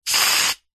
household aerosol deodorant short spray; zapsplat

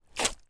boot foot pull out thick mud puddle

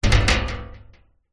foley metal sign loose hit wobble

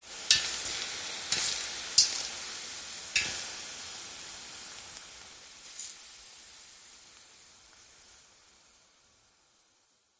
liquid water ice drop hot metal sizzle burn; zapsplat

